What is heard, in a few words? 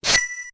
Menu Select; Make a selection (also Link's sword gleaming while using a Medallion); Zelda